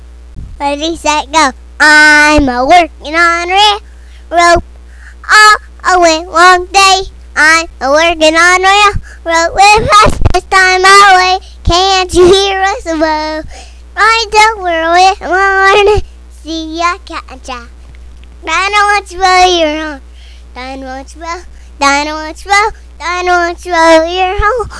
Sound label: singing